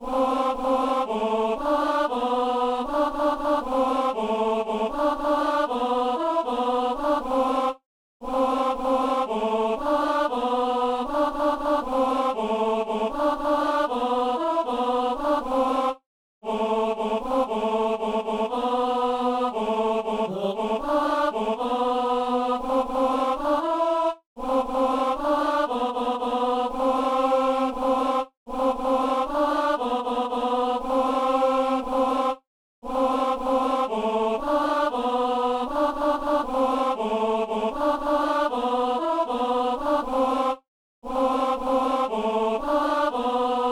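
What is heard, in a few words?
tenor; Messe de Saint Paul; Sanctus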